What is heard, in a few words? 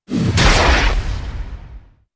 Shockwave; Magnus